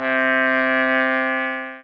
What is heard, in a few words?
sax